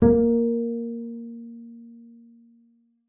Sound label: A#3, bass pizz; Xsample VOL-5